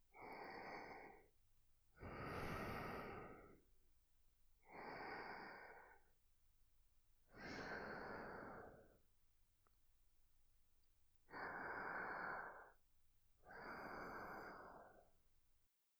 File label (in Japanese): 寝息（反転・右）